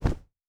Cloth Grab; Survival Game Sounds - Lite Edition

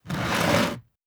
Rock Moving; Survival Game Sounds - Lite Edition; Environment

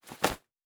Bag; Survival Game Sounds - Lite Edition; Objects and Items

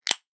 Can Open; Survival Game Sounds - Lite Edition; Objects and Items

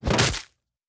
Trap

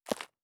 Dirt Walk Surface, Footsteps

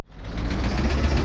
Engine - Start; Survival Game Sounds - Lite Edition

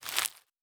Foliage Foley; Survival Game Sounds - Lite Edition; Environment

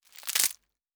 Wood

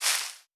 Foliage Foley; Survival Game Sounds - Lite Edition; Environment

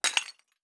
Glass Shatter; Survival Game Sounds - Lite Edition

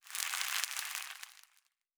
Sizzle; Survival Game Sounds - Lite Edition; Environment

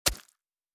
Stab; Survival Game Sounds - Lite Edition; Combat and Hunting

